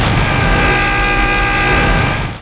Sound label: fall; quake2; mcclane